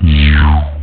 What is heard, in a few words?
Drone; Arena Games 3.0